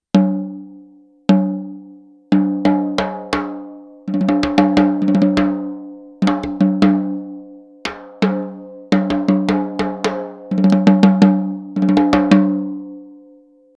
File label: no snares; Taylor Hawkins